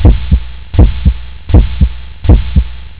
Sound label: heartbeat